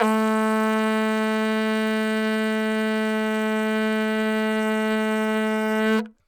Vuvuzela

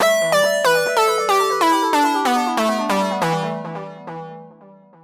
Synth loop 140bpm E2

